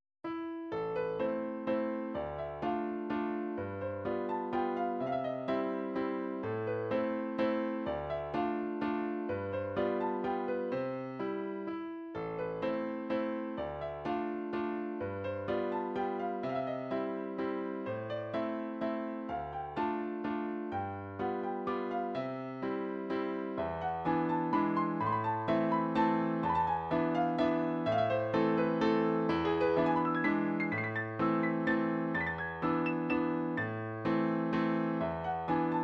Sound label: piano waltz